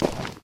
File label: dirt footstep; minetestforfun game